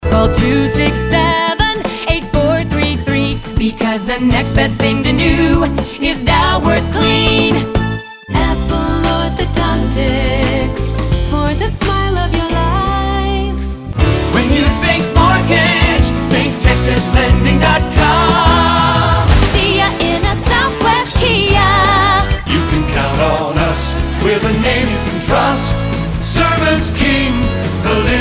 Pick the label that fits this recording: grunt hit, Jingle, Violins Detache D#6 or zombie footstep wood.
Jingle